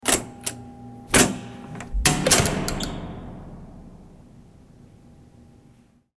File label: door